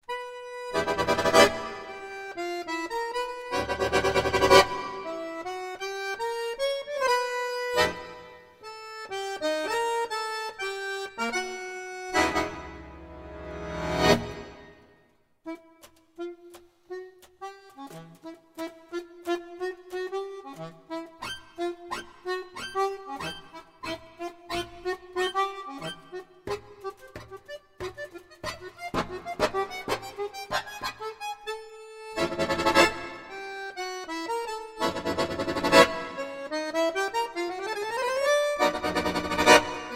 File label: jazz partita